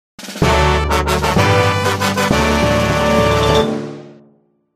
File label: fanfare, jingle